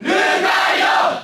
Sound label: Cheer Japanese; Lucario; SSBB